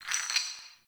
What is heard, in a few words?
Cave Ocean Rock Crumble; Ambience; Midrath Island